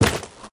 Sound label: step; smite; heimdallr